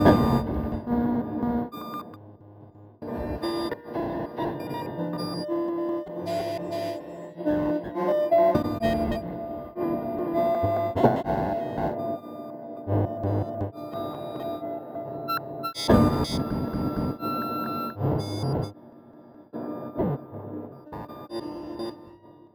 texture intermittent, One Shot Textures; Transmission-X

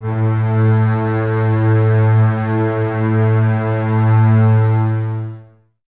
bass A 2, String Ensembles, Basses, Lo+Top; Miroslav Vitous; 90 s Sample CDs

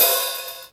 HAT, Hip Hop

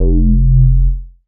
bass classic F; Polyend; ARC Noise

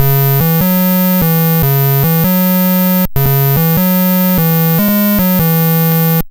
Apple II Bass, Chiptune

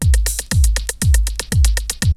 LOOPS, TECHNO; Syntec; Wall of Sounds; TECHNO-BOX

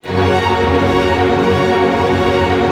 STR ARP MA, Arpeggios, Arpegg.Major; Roland LCDP08 Symphony Orchestra

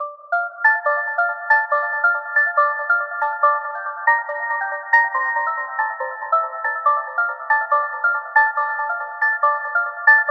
140BPM D; Vengeance - Essential Clubsounds Vol.4

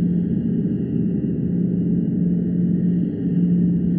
sparse soundscape samples; ReLooped

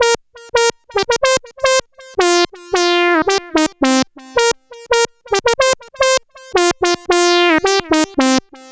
Funk Line; Dr. Fink's Funk Factory

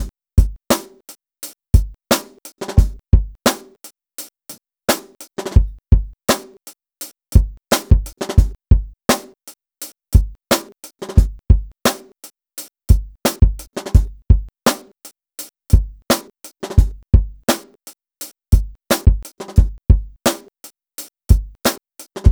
Drum Kit, Electro Lounge; Sonic Foundry (Sony Creative Software); 086 Huggybear

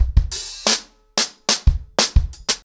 90 BPM, acoustic drums, drumloops; The Roots Drumloops

